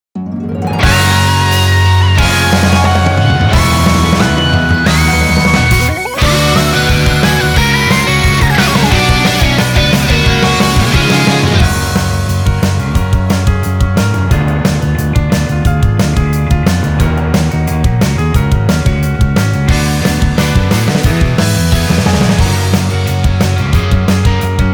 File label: inst